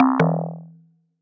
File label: lose